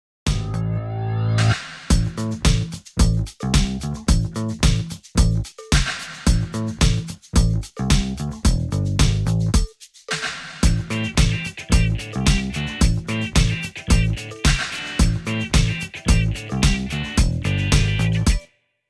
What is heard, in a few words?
rhythm BGM